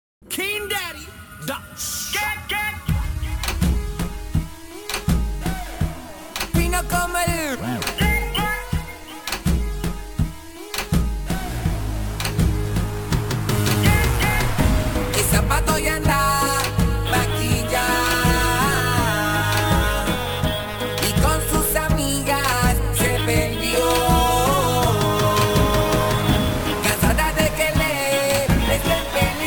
reggaeton mix; reggaeton mix evolution

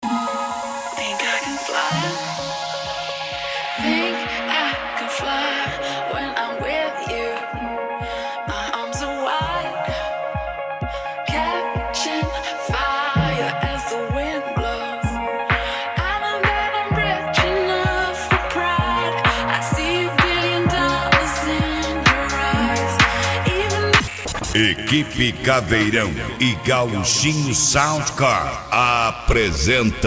especial mega funk

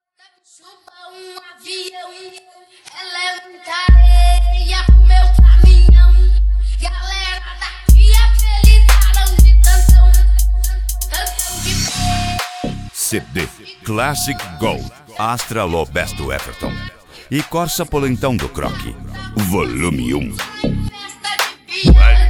low bass; classic gold astra; corsa polentao

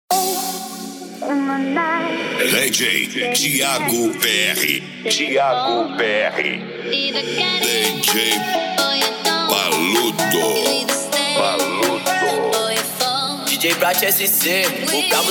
mega funk; say right; f 250 azeitona preta

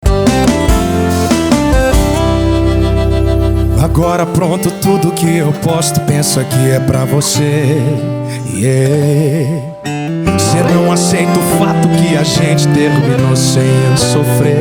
sertanejo

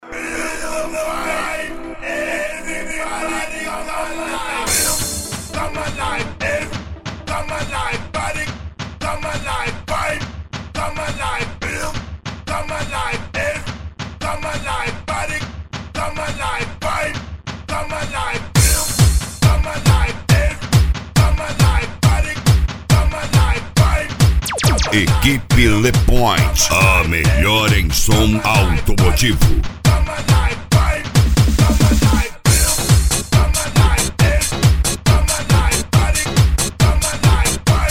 deep house

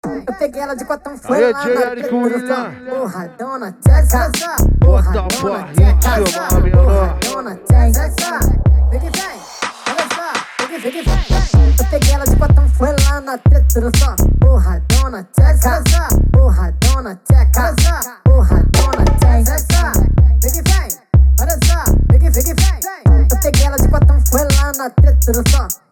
mega funk; g4 do deejay vol1

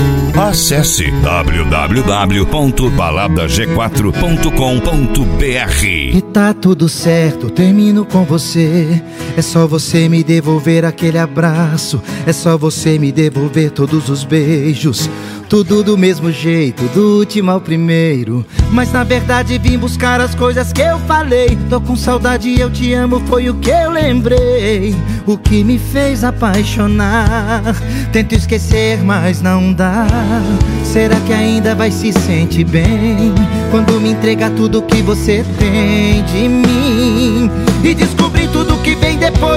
sertanejo; balada g4

